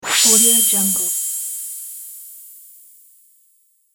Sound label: magic protection; magicsoundeffects